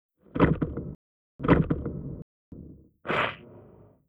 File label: Incoming swarm message; Stellaris